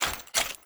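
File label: dark knight run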